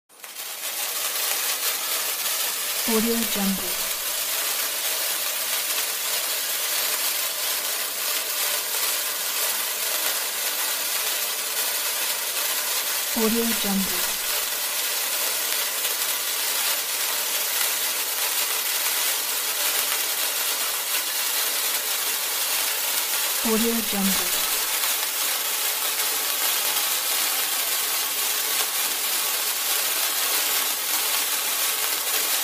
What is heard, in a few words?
sparkler